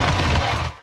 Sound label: bridge close, electromagnetic plant; Factorio